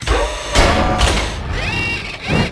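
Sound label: tank attack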